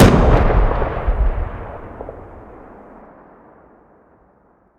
Explosions Explode Distant; DCS

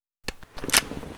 Inf Weapons Foley; Red Orchestra Ostfront 41-45

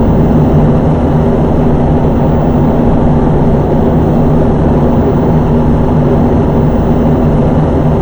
F-15 Cockpit Eagle Roar In; DCS

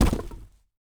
campfire deploy; Rust

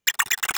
drone chirp

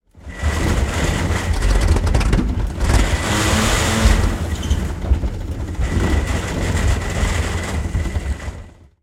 engine broken, tanks; War Thunder

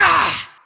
vce dfa land

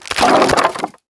objects, World of Tanks

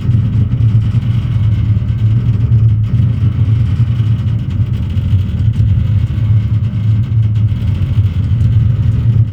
Wings Overload, Damage; DCS

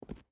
footstep rubber boots concrete walk; Rust

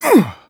vce lift

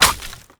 plank small

